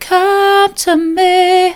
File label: come to me soft, vocal